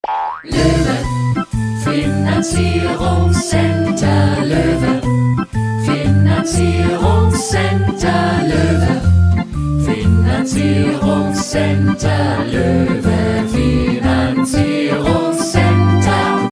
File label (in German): Klingelton; Loewe